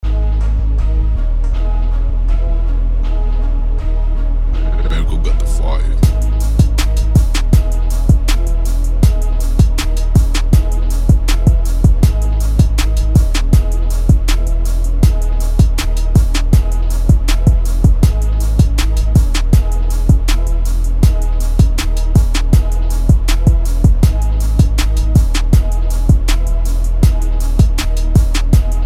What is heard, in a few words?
epic heavy trap beat instrumental